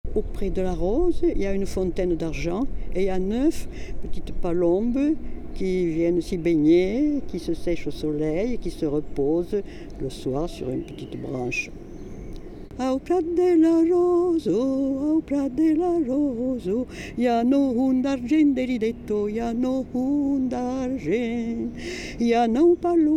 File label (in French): chant gascon; sonothèque nomade